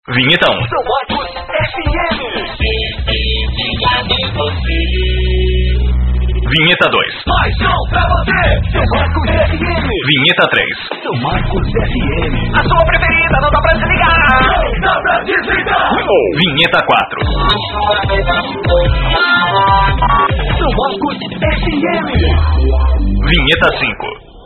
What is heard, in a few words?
vibe